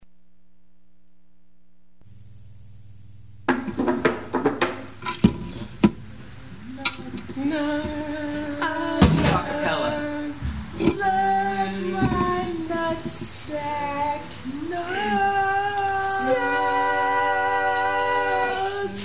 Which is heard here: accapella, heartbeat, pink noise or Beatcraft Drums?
accapella